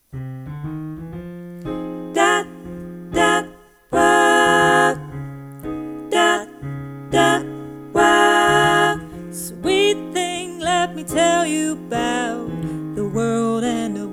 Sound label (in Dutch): zonder sopraan